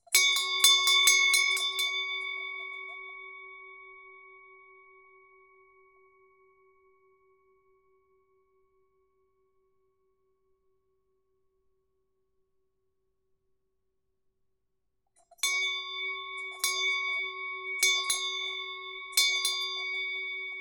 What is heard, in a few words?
bell